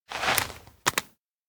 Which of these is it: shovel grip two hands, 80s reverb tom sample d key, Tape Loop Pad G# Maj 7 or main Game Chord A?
shovel grip two hands